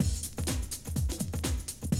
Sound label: Classic DrumLoops, 125